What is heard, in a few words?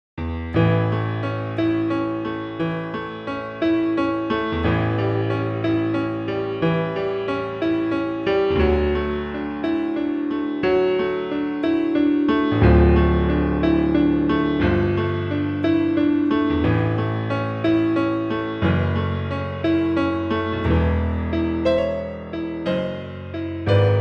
Piano Blues